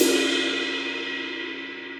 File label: RIDE 22 edge crash